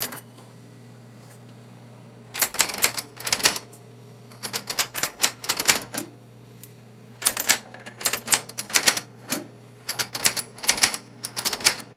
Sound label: clunky cash register key presses; foley